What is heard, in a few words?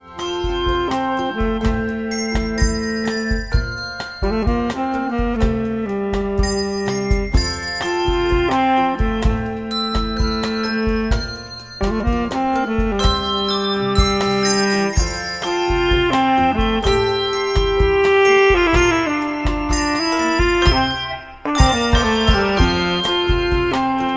bell bossa